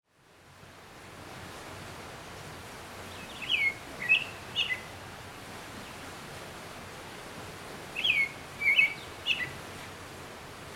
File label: ISLAND AMBIENCE OUTSIDE LIGHTHOUSE; CANA ISLAND; Interactive Lighthouses